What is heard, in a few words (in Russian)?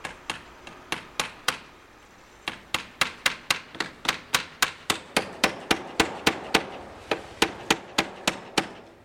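строительство звонкие в пространстве удары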